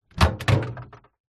household drinks fridge open

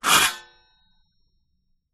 Metal Trowel On Grating Scrape; Sound Spark

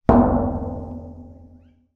impact metal empty drum large resonate

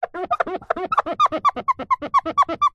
plastic squeak rub finger fast; foley; zapsplat

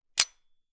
gun 1911; weapon; still north media

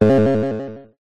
multimedia game sound classic 1 up

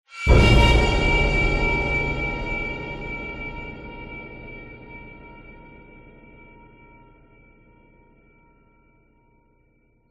horror stab dark scary cinematic

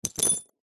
industrial bolts metal few small set down carpet

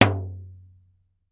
toy drum hit; sound effects one

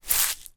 seasoning large pot tip

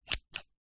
office scissors cut air single snip

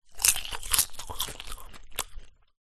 human eat popcorn

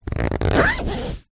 tent zipper door compartment; foley; zapsplat

